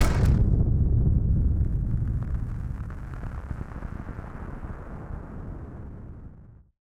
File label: Firework FX; musicradar; bomb factory